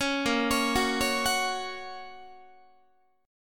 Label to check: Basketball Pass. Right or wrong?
Wrong